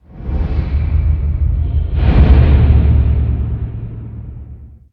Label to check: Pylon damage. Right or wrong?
Right